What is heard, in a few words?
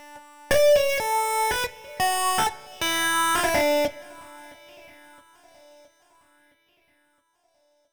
Solo Synth, 120bpm D; Zero-G; Nu Disco; Happy People